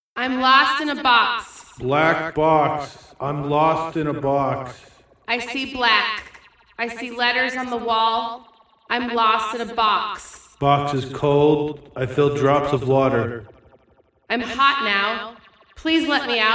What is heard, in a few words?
weird, 120, Bbm, Acapellas